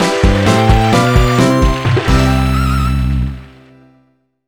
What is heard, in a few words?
Jingle Level Ok